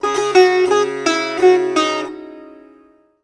SITAR LINE; SITAR RIFFS2

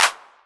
808 Clap; Novation Drumstation

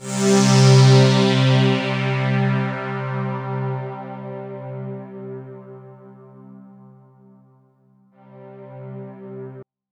POLY SAW, Roland JV 2080, C2

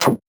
VocoBit Hit, 8 bit; musicradar; 8-bit bonanza

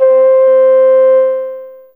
Flt Hi C3, Wind; Fairlight CMI IIe

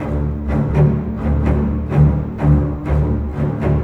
Cinematic Strings, Orchestral

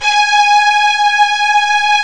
G#5 MEGA STR; ORCH.; ORCH.COMP

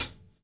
GRV, Groove; AMG Gota Yashiki Groove Activator

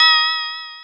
Multi FX

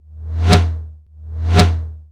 tom, drums; CMP - Analogon

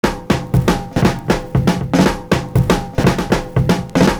no ring; Univers Sons

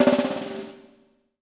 BUZZ VERB, PROC SNRS, Drumworks; Sampleheads; New York City Drumworks VOL-1

